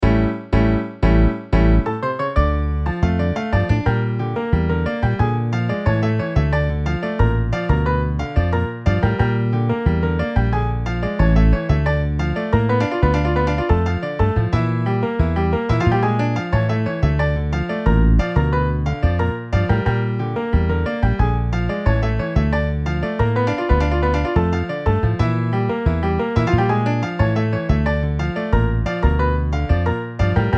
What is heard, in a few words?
Piano Bass MIDI